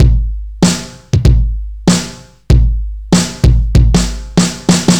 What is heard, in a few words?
96 bpm drum loop sample g sharp key, Free drum beat - kick tuned to the G# note